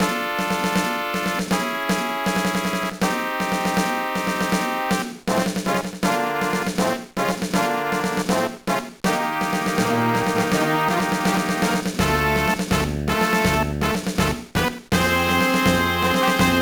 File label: BGM; SD Gundam G Generation Advance; gba